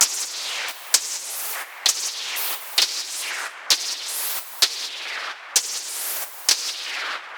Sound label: 130 Perc Cyber Clock; Zero-G; Future Wave